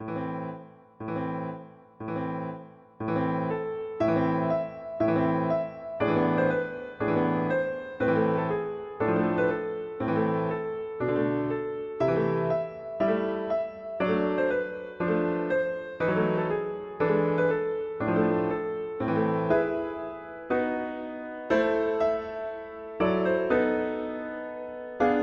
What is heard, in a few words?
Peasant Song; Grieg; Norwegian